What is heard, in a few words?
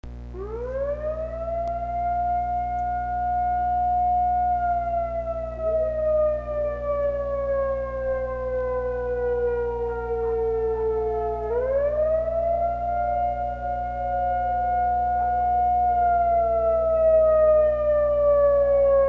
Whelen Siren Test; Aurora CO; sirens